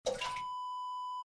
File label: sauna water droplets